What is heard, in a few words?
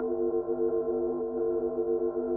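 ambience holo sign; angel city; Titanfall 2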